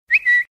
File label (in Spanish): iPhone 13 Pro Notificaciones